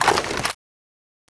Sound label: t68 deploy, weapons